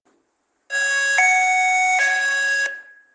hi low ambulancia, sirene; 27C10 NOBREAK

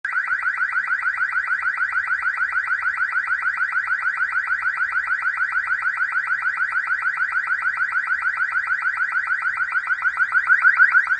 sirene alta potencia

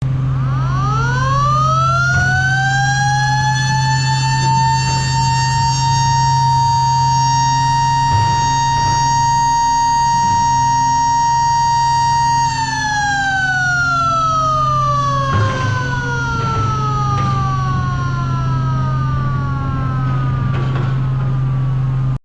Som, sirene alta potencia incendio; EG-103; eletromecanica; Engesig Sinalização e Veículos Especiais

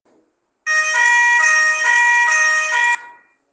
fa do bombeiro, sirene; 27C10 NOBREAK

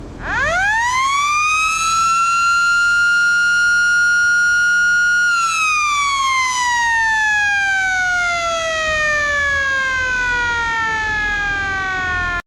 Som, sirene eletromecanica, incendio; EG - 100A; Engesig Sinalização e Veículos Especiais

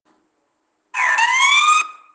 wail médio, sirene; 27C10 NOBREAK

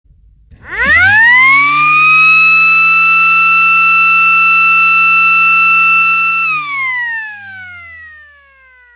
sirene eletromecanica; DP3000; diponto dp2000

